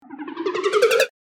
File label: Infographic data chart expand